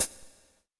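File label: hi-hat, drums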